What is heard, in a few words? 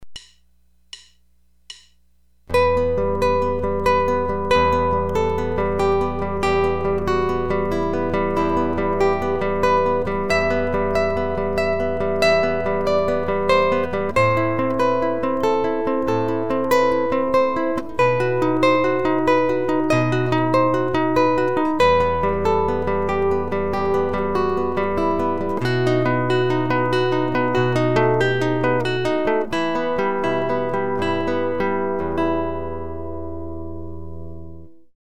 Romanza, guitar